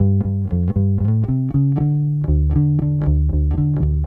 Bass, 70s Analog Funk

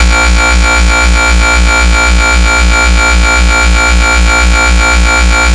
FS (A#1), FightSynth; neuro; Stanza; FightSynth Multi

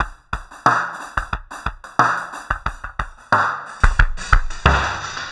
Street Tip, R&B Drums

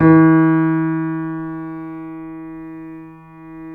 C7 PP, The Ultimate Piano Collection; East West

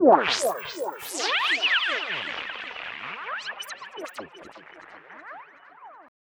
SFX, Afterhours EDM; Sonic Foundry (Sony Creative Software); Retro Fit

